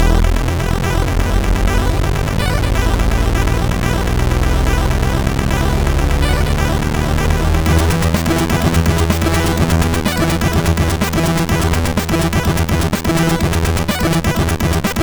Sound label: Bleep Bloop, CSG8580R5